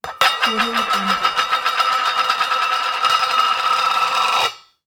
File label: spinning small metal lid